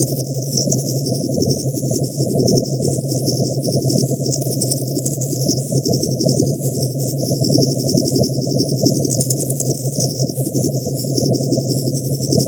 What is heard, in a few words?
Attack Osprey Engine; Warframe; Corpus